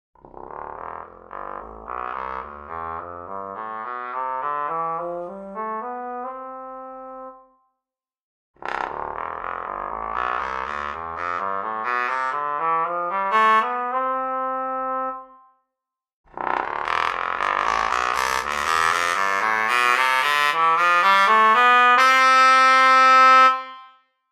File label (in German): Bassposaune Straight